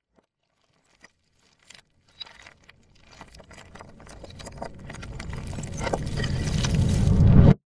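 fantasy rebuilding reversed debris explosion spell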